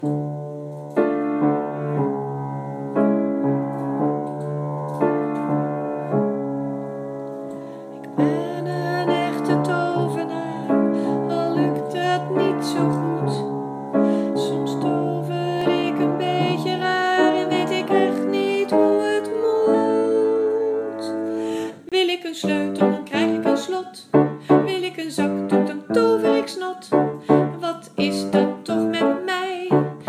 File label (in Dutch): het lied; muziek verhalen groep 3 4